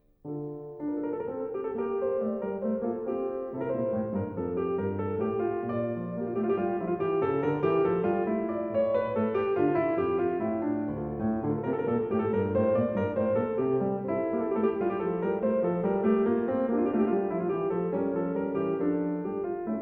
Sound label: Menuet, KLAVIER; French Suite #1 In D Minor; BWV 812; BACH